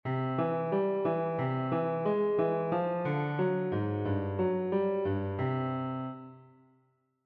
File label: bassline